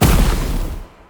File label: ice blast